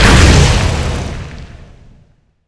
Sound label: skill start; weapons; bufffiveseven red; cstrike